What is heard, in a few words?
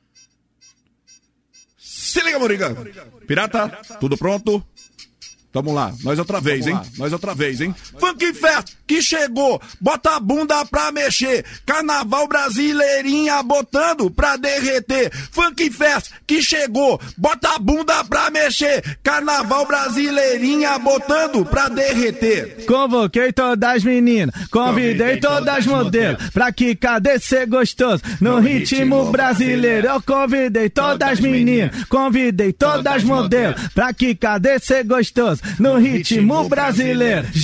acapella; brasileirinhas 2020